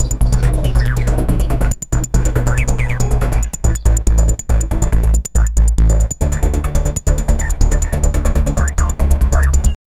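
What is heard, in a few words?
arabic rhythm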